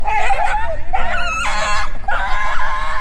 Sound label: gorilla screaming